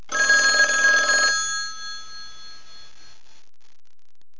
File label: ring; Phone